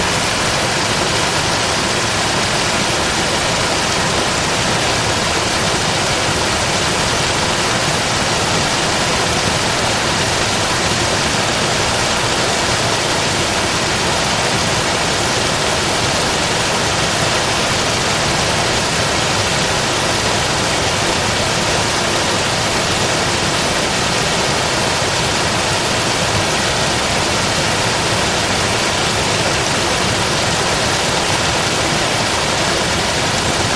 waterfall

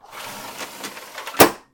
accordion screen

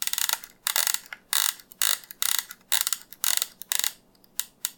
setting clock; electric

